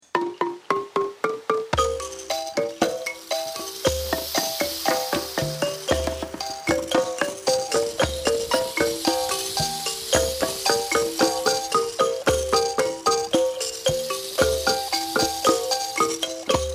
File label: Ballaphone